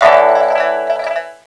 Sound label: EAST GUARDIAN attack; Eft